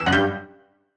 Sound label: scatter Hit; excalibur